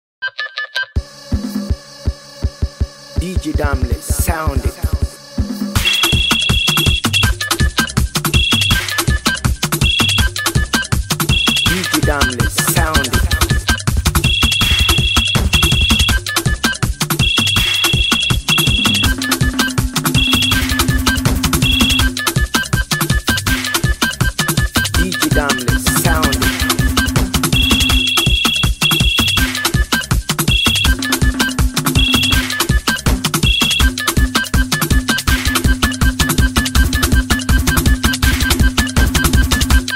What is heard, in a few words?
Dial Tone Free Beat